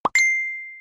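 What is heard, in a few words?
beep android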